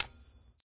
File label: Coin Flip Underlay Play